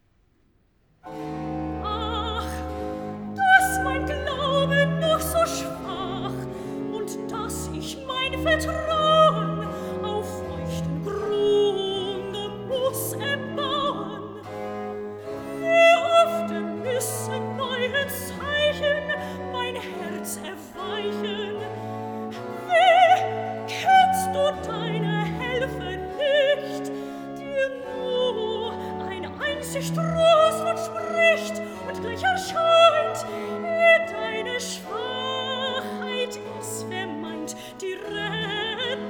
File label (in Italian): Recitativo con Choral (Sopran); vocal; soprano; BWV038; Aus tiefer Not schrei ich zu dir; Ach! Dass mein Glaube noc; BACH